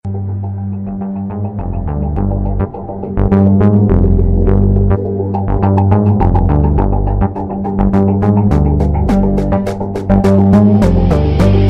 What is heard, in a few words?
Dance music